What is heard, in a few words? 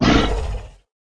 orc mage damage, monster